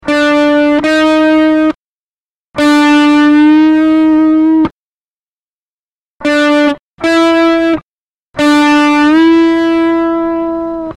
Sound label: bends; 3 essential notes; better blues solos